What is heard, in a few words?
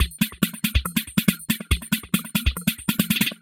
electro beats 140